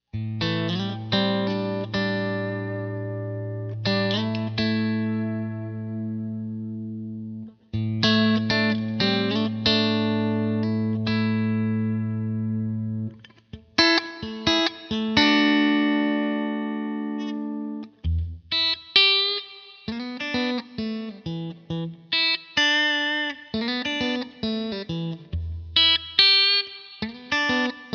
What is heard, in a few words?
bridge, custom pickups